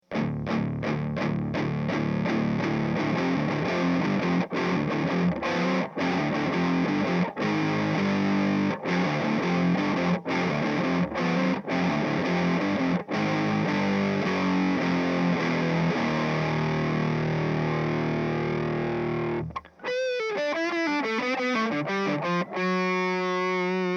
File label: Eminence Red Fang Guitar Speaker Heavy